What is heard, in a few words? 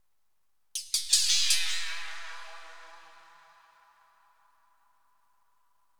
DRUMLOOP, AMBNT, 160; Ambient